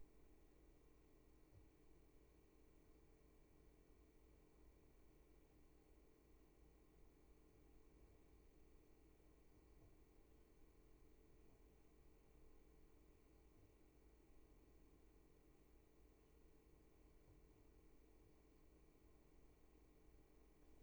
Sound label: room tone